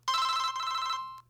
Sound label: motorola krzr k1 ringtones